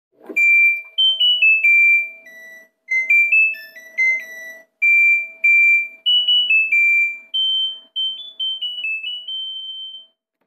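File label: end alarm, washing machine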